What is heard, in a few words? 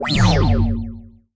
landing; smite; ahpuch skin10